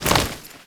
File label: Hoverboard Land Anim B; Vehicles; Warframe; Venus Hoverboard